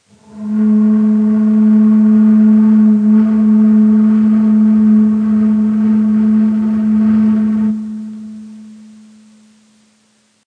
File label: Pes Gevasht, Ney